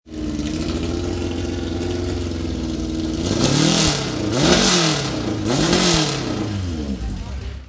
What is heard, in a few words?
rev